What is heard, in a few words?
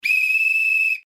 whistle long